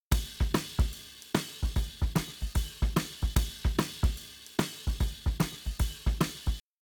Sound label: Drums, shuffle drums; WDR2